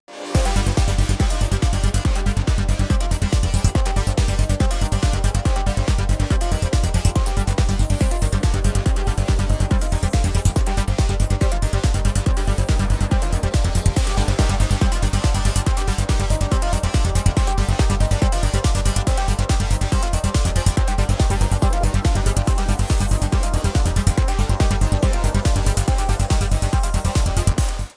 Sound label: binaural pan; psypan comparison